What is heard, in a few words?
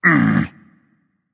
tongue berserk; cuchulainn